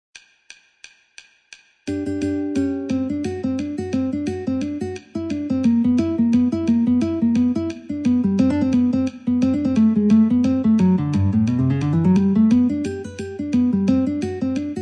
Rag Guitar metronome 88

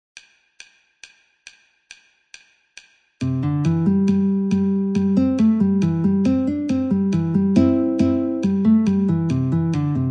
guitar metronome 69

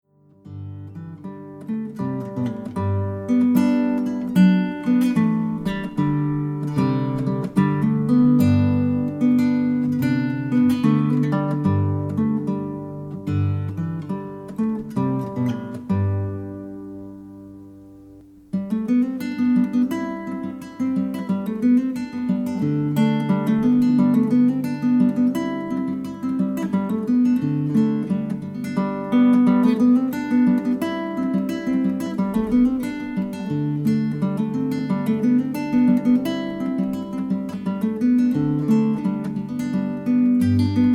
Shaker Medley

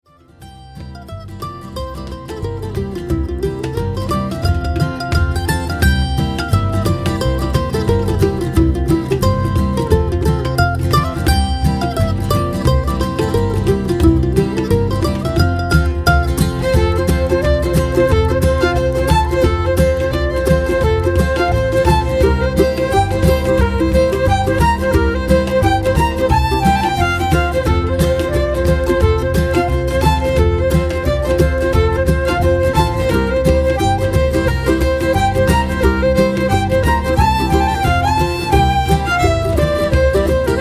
Ross Reel Number 4

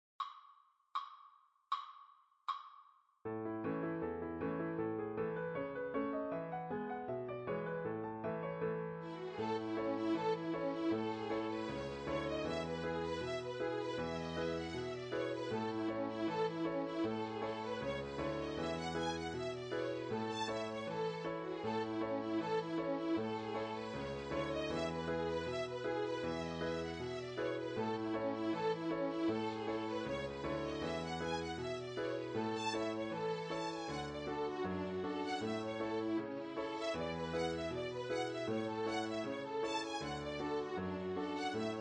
80bpm

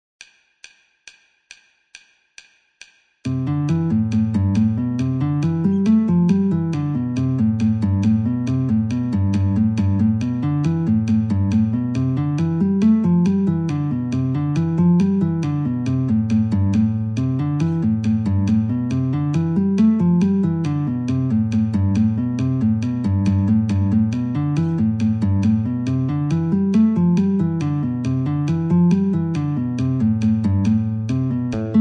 Reel Guitar metronome 69

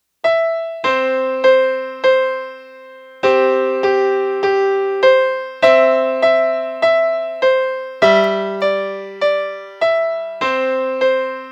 Turkey A melody

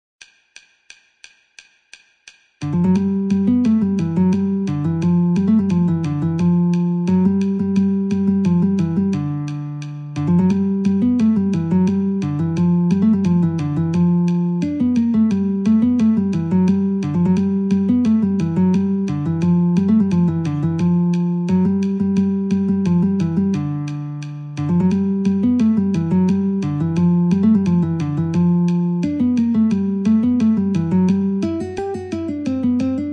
Reel Guitar metronome 88

